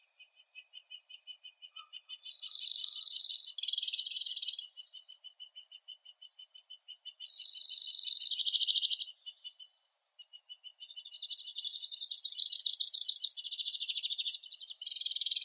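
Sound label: white-winged crossbill, loxia leucoptera; klest belokrilii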